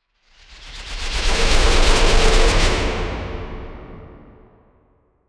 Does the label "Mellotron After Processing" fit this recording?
No